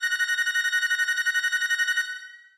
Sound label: train bell